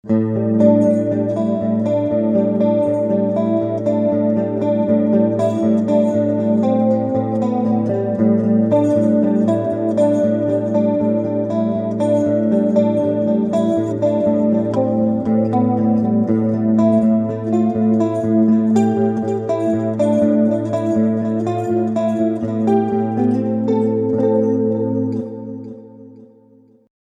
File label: delay picking